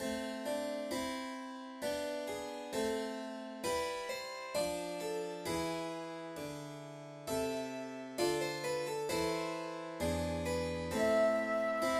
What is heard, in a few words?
recorder sonata in c major larghetto; handel